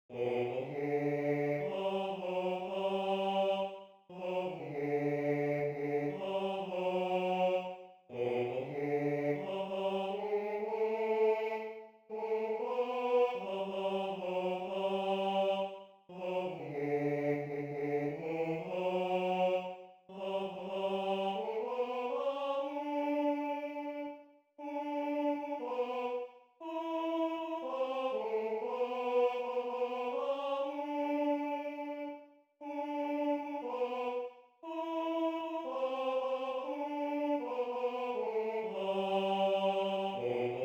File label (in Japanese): 団歌 4声 Tenor